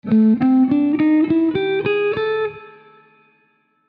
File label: Bubinga wooden pick; SixStringers